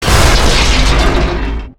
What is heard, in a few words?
objects, World of Tanks